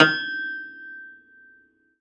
pno G4, 10th Flageolets, Total Piano

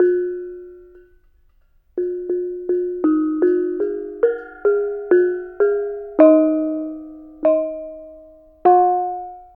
BONANG; Spectrasonics; Heart of Asia; BONANG PH

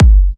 Electro Kicks

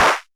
Clap Low, Modular

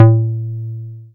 Bass SH-101

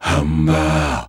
Male Voice, African Voices

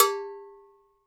COW, NYC Percussionworks; Sampleheads; 90 s Sample CDs

